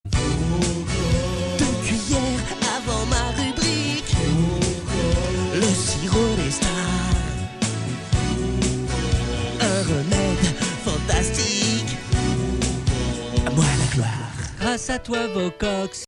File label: Vocox